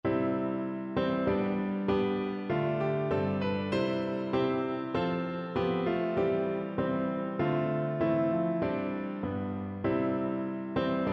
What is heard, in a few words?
piano; Christ Is Risen Alleluia; SATB